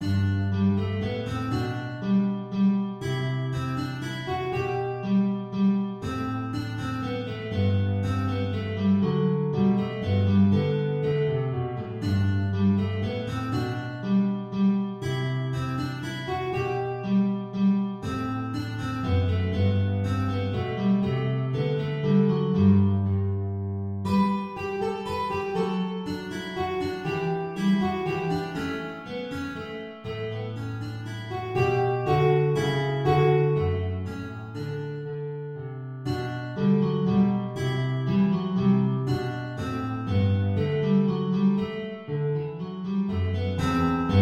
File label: minuet in g guitar